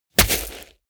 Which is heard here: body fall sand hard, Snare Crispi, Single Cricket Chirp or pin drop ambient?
body fall sand hard